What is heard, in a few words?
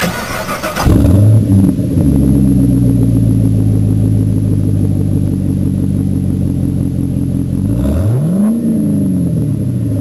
Chevy Camaro BB 67